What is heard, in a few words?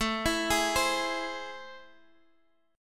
A Minor Major 7th